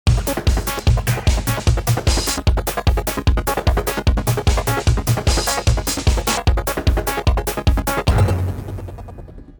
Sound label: Agent secret link; Jingles medley